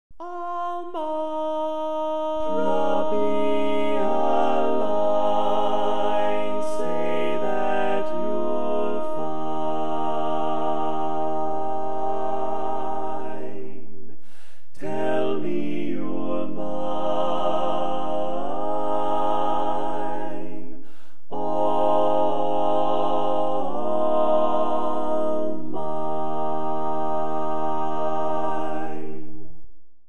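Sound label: Baritone, barbershop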